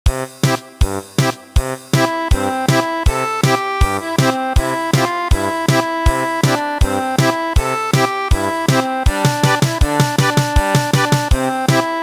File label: German Polka, traditional; The Campbells Are Coming